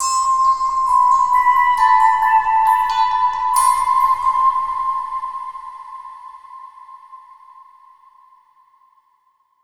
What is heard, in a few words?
Overlay Tone; Act2 Rezoning